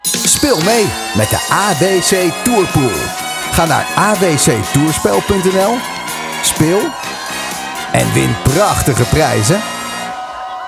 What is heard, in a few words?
jingle; AWC Tourspel